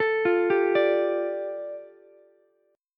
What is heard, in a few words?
A 7th Suspended 4th Sharp 5th, A, down, Ukulele; A7sus4#5 arpeggiated